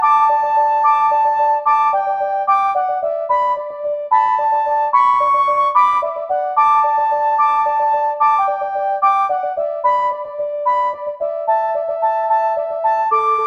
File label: synth end; afar; flute-synthesis